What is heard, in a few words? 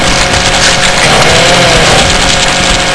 chainsaw gibs, weapons